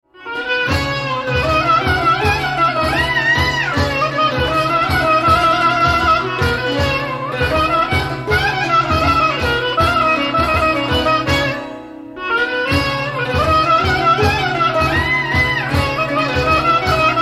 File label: Syrtos Koftos